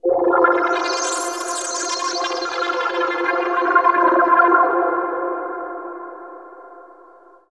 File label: AMBNT 160; Ambient